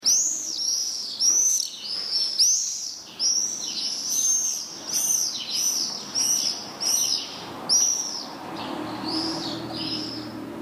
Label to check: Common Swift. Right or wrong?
Right